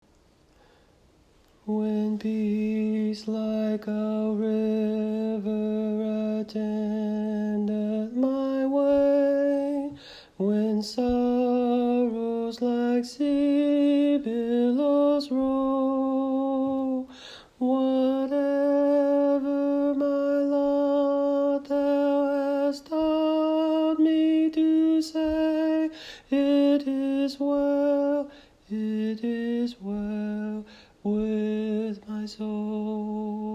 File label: Tenor; It Is Well With My Soul